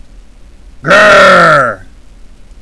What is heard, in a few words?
grrrrrrrrrrr